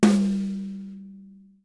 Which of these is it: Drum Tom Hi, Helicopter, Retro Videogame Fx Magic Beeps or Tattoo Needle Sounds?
Drum Tom Hi